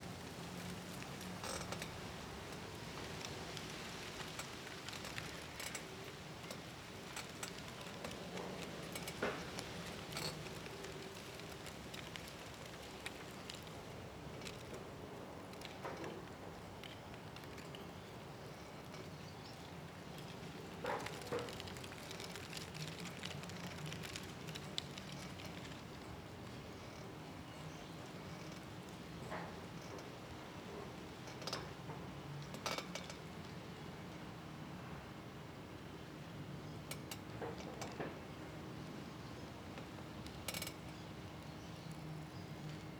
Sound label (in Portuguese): Mastro de bandeira rangendo na praca com vento suave, arvores folhas secas; passaros; Colecao Sonora do Cerrado